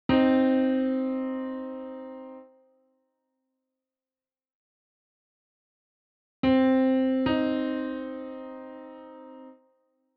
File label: interval